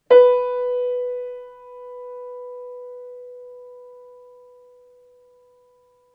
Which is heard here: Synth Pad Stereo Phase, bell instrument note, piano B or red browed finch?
piano B